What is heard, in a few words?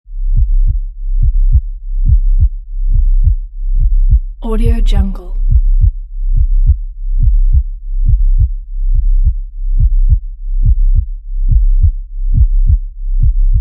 heartbeat